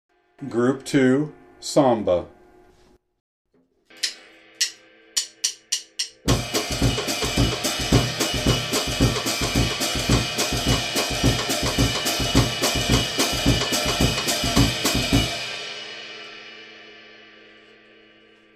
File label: samba